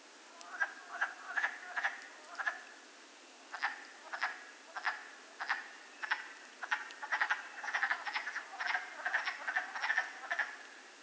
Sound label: Creature Calls